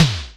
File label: Electronic Snare, Drum Machines Roland R-5